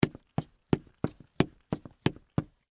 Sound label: Boots Run, Concrete, Short; Footsteps; Human; BlastWave